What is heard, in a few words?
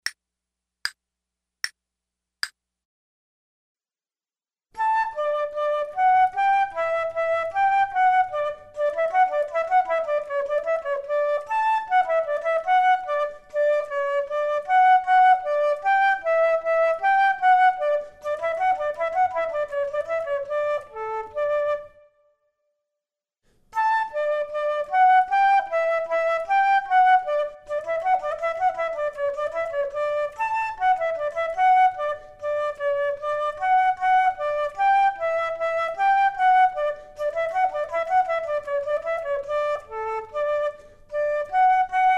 Duets flute; Selected Duets for Flute; flute 2